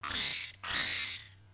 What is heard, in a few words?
Monster Dying